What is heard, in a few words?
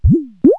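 big bubble; Wired for Sound Pro CD; Aristosoft, Inc.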